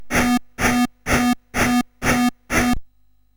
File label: Synth Sample, CSG8580R5